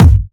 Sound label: smooth steel kick drum sound F sharp key